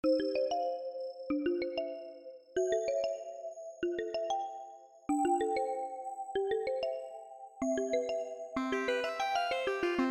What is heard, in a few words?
Bell Piano Soft